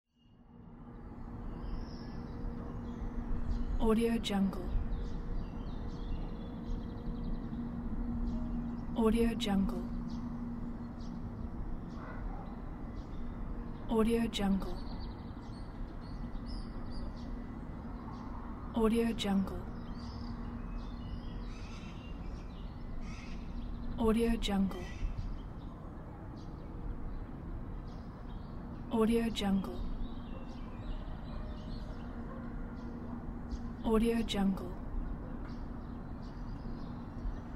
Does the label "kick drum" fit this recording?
No